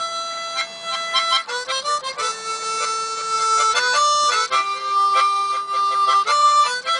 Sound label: Harmonica